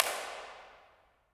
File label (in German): kirche, ir